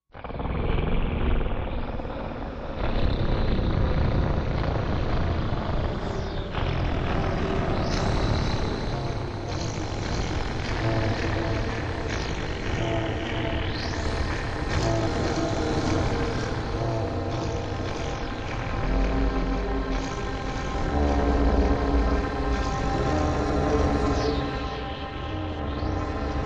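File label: Demon Dreams Low Creature, eerie ambience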